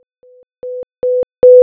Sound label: plus 1 dB